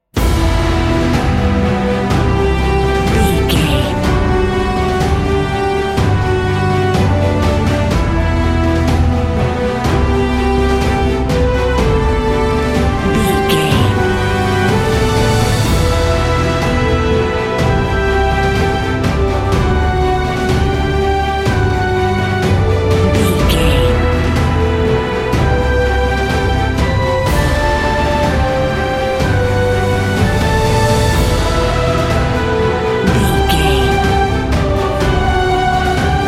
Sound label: epic cinematic